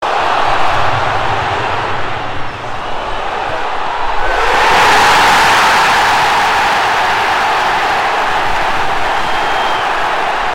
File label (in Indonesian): suara gol sepak bola